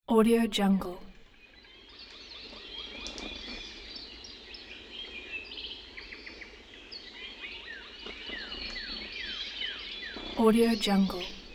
summer day ambience; alexleadsounds

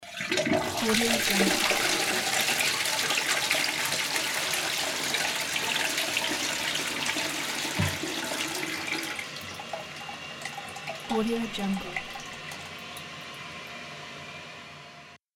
toilet flush; kharleyka

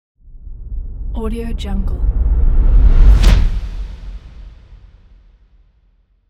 reverse swoosh fx; anderington

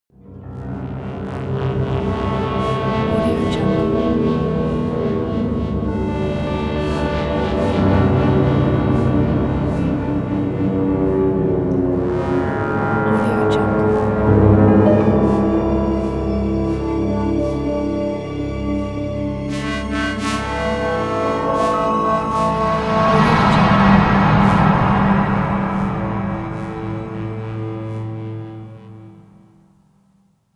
atmo drone obstrusive orchestra

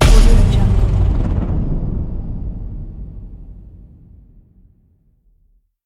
cinematic impact boom

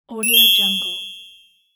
pop bell

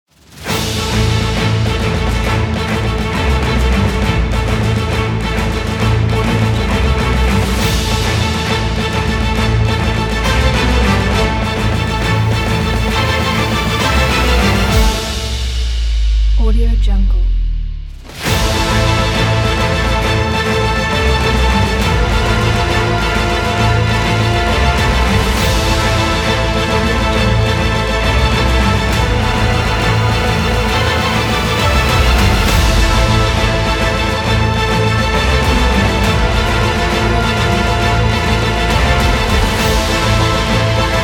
cinematic hollywood trailer

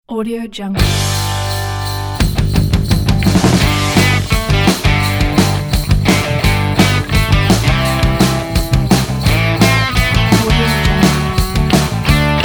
jingle bells christmas rock

